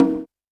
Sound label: CONGA, CONGAS; Best Service; Dance Mega Drums CD2